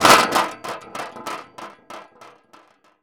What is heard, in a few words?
wall metal; Golden Crayon Sounds